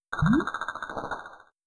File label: Jelly Idle